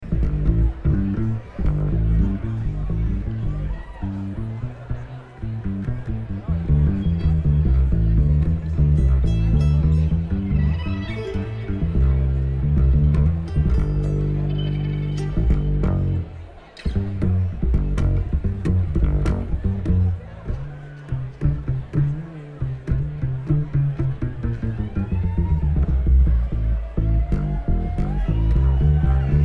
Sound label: Traffic Jam